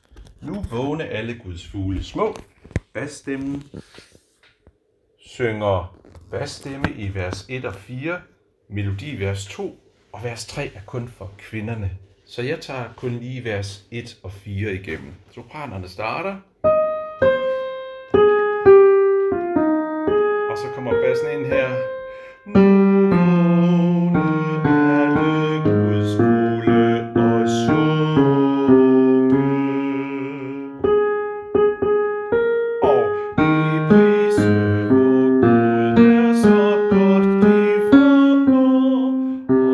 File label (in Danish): Bas; harlev koret